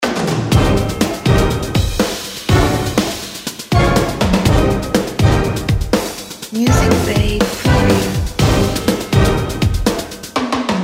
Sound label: competition escalation